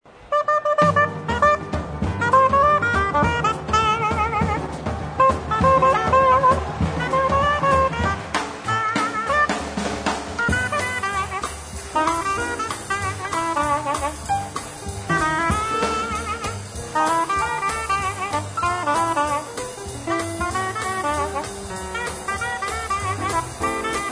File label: Blues, Saxophon